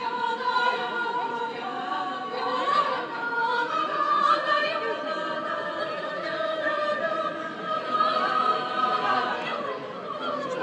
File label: choir perform at a party; jork s choir; launching manchester international festival